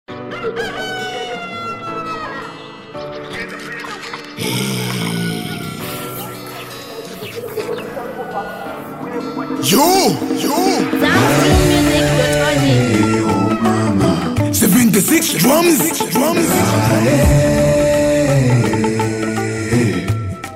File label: Drums